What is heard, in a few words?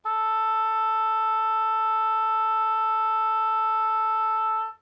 oboe